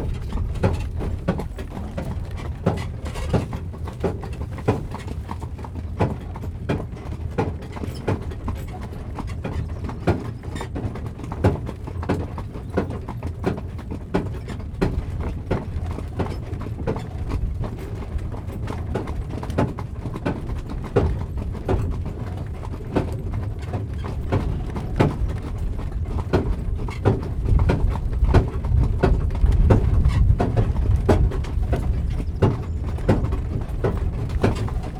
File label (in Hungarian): szekerezés, lovaskocsi hortobágyi puszta